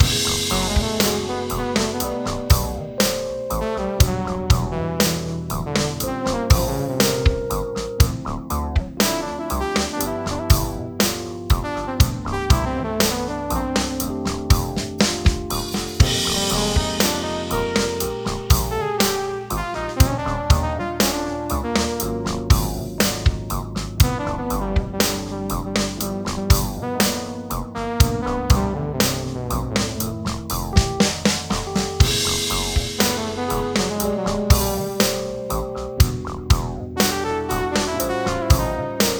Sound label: smooth